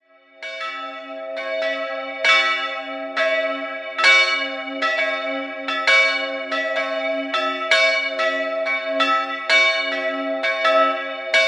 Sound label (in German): Buch Rid, Glocken